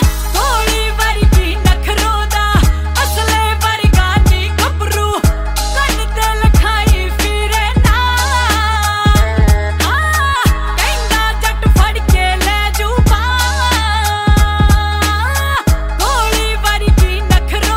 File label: music ringtone